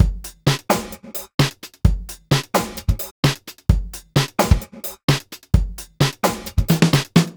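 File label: 130 bpm, beat loops; Zero-G; Monster Beats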